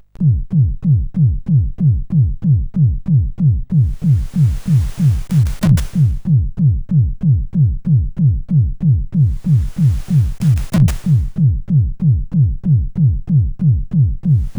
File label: sid, CSG8580; Heavy; hvsc